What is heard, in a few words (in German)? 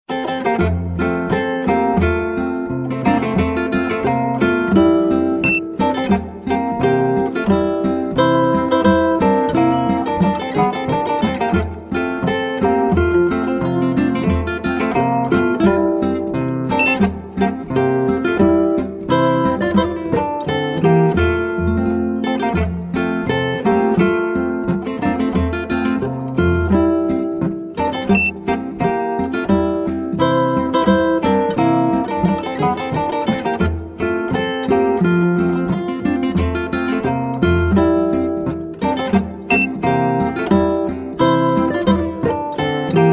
boarischer; oachberg